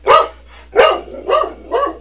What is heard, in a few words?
BARK